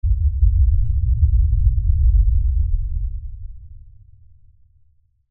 LFE Explosion, Lfe Explosion Low Bass Blast Rumble; Blastwave